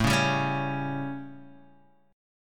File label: A 7th A, Guitar DADGAD; 7,4,5,6,4,x